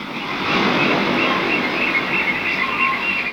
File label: SEA; XXL 800 Production Effects